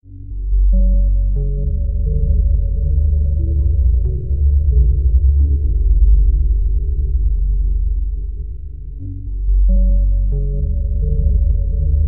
ocean depths

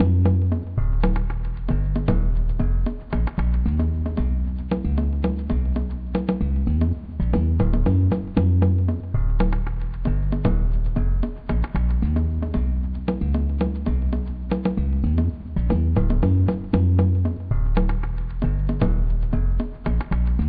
bass; Toxic Chemical World

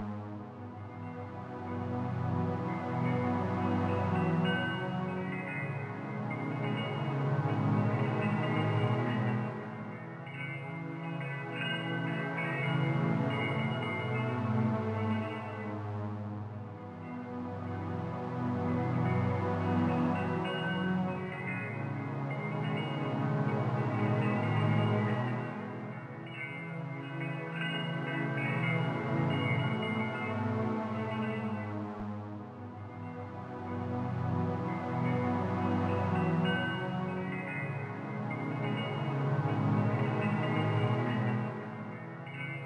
CHIMES extended

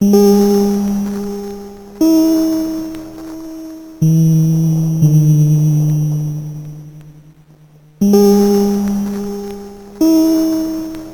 forest outskirts

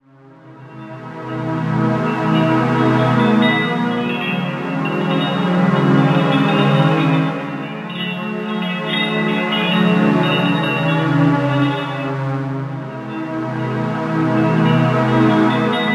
CHIMES

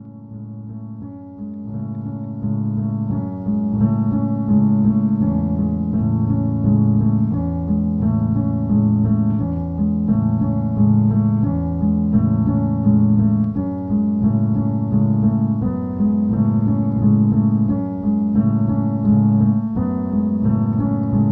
Rio Piano

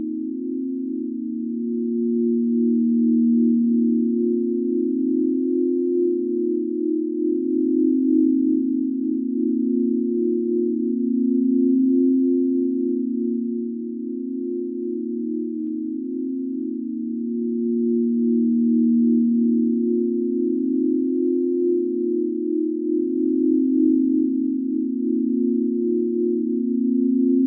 bgm slow

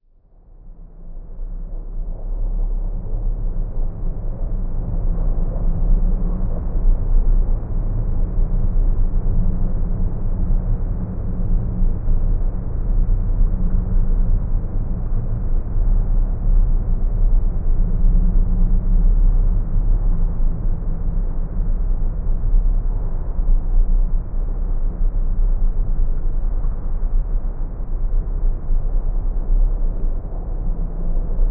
Dark Bunker Hallway drone

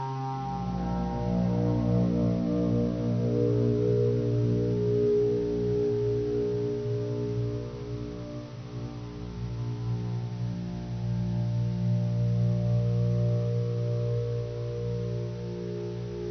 bgm slow

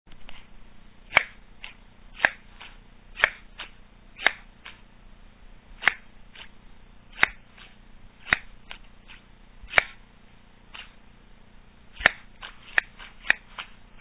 Scissors slow

